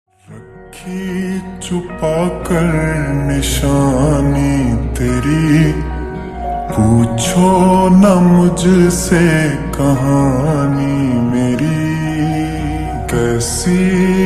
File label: Slowed Reverb Ringtone